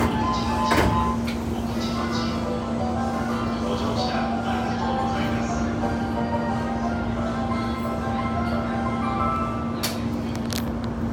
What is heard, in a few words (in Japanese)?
京浜東北線御徒町北, train sounds